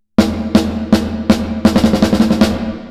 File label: VERY LOW FREQ REVERB; Seventh Heaven Professional